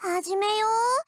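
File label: weapon get melee; m4mod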